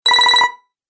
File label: Game Collect Your Coins, Video Game Sound Effects